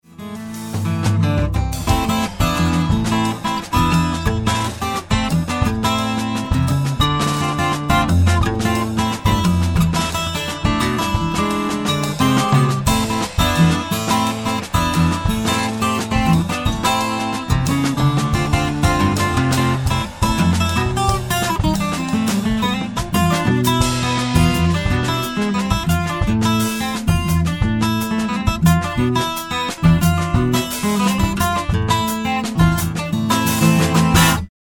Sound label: Jingle Bells; kerst